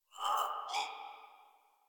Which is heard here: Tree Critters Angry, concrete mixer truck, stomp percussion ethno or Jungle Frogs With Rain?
Tree Critters Angry